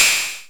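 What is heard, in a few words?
MS20 HAT OPEN; INIS - Funky Xtreams; MS-20 KIT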